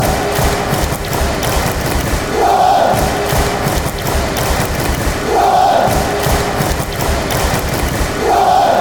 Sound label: clap, chants; charlton